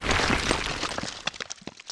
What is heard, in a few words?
explosion debris